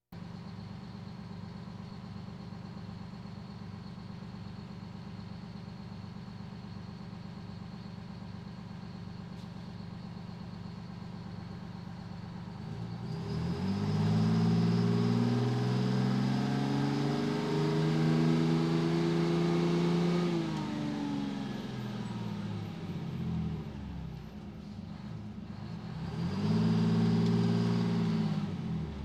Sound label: Police, Fire engines